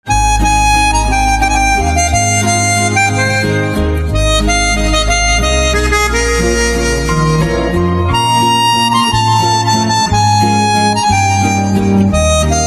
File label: Pianica, instrumental ringtones